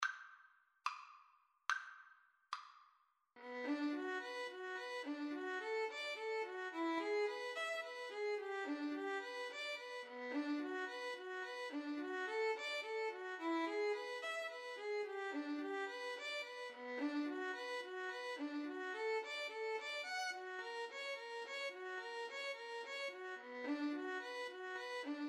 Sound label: violin duet